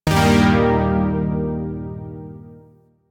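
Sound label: Shawzin Minor Chord C; Zariman Shawzin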